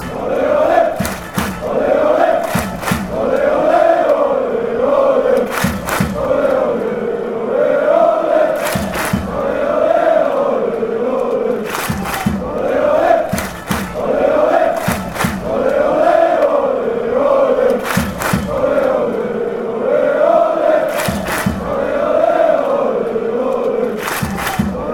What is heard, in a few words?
slovenia chant, fanchants